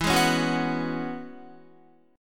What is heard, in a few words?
E 6th Add 9th E down Guitar; E6add9 strummed; JGuitar